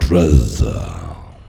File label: VOX VOX; Sound & Vision - Gigapack II CD 2